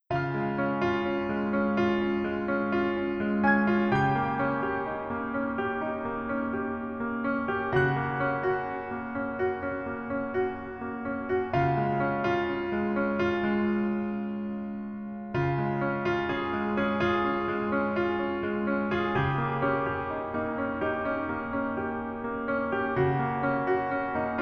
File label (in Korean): Piano ver 멜로디MR